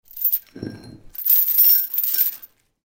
Footsteps Chain

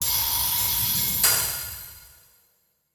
ice magic large; 魔法効果音

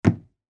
footsteps wooden floor; 足音

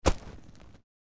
footsteps gravel

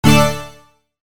break and chain

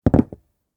Put down the beer mug

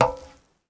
Footsteps Iron plate One

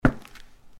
Footsteps Concrete Asphalt Street

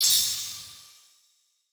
ice magic middle; 魔法効果音

